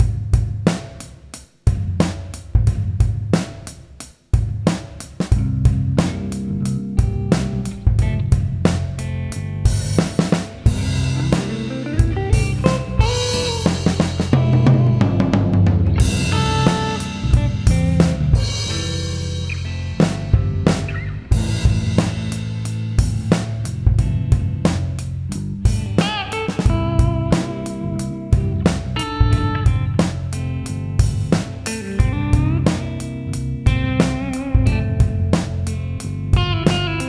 bluesy, Drumset plugin with natural sound and player; Natural Drumsets is a series of drum-sets with a comprehensive range of effects and a versatile drum player.